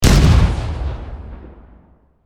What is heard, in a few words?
Armored tank cannon fire single shot